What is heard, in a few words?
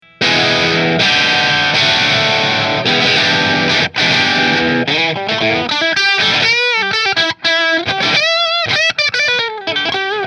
JB14 Driftwood Bridge Marshall; Nola